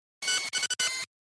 phone

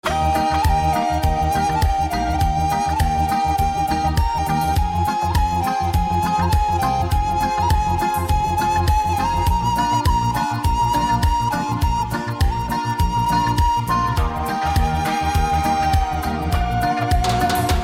Song Of Ocarina

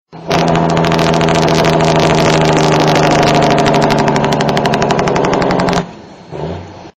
Jake Brake Sound

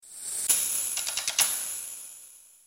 Jingle

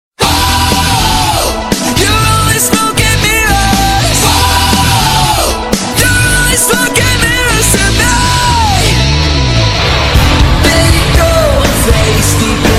Rhodes

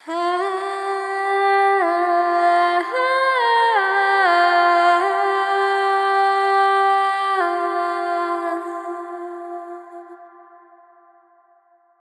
Vocal Swell

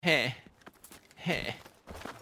"HEH HEH"

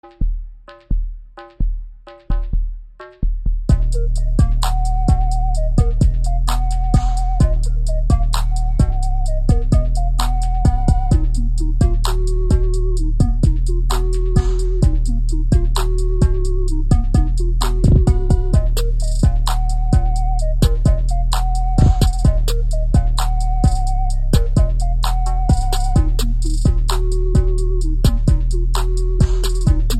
Drip Instrumental

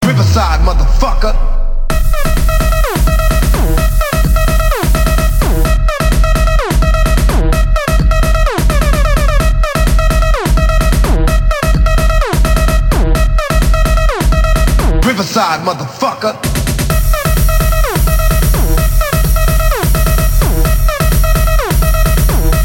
Electronik Club

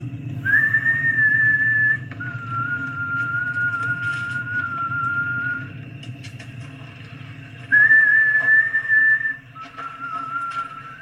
whistle; Neegans

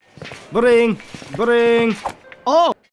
Ring Ring

